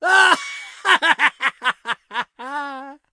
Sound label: dwarf laugh